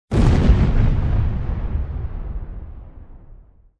bombing; Helicopter; crashes bombs misc; Zomboid; Expanded Helicopter Events

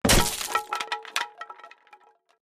2x4 Break; Scrap Weapons; Zomboid

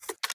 Lever Action Load; Firearms; Zomboid

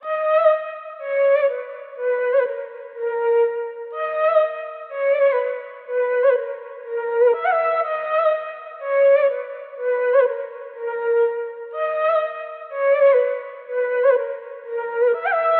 flute; Zomboid; EntertainYourself

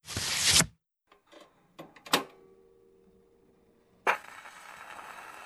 Vinyl player service; Zomboid; True Music; Tsarsound

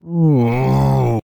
Man Frustrated; Zomboid; Lifestyle

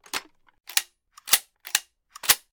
Firearm M60 Load; Firearms; Zomboid

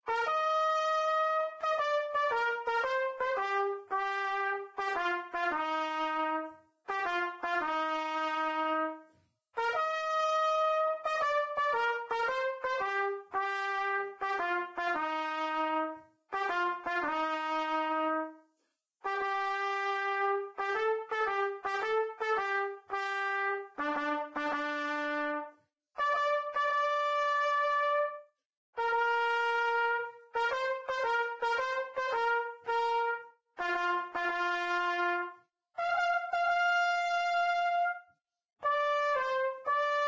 Trumpet; Zomboid; Lifestyle

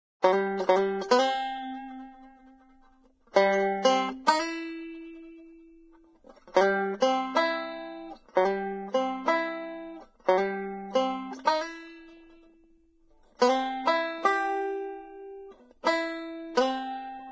Banjo; Zomboid; Lifestyle

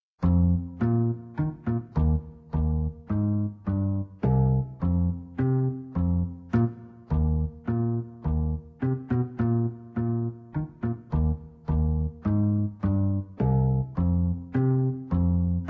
Guitar Electric Bass Duet; Oh Susanna

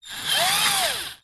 cordless drill swing; Zomboid; The Workshop

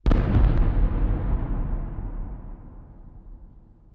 helicrash; Helicopter; crashes bombs misc; Zomboid; Expanded Helicopter Events

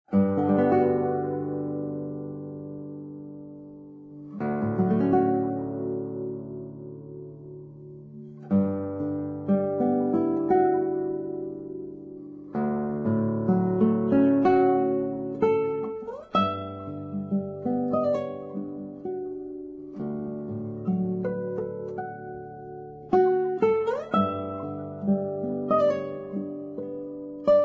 guitar acoustic; Ocarina of Time; Zomboid; Lifestyle

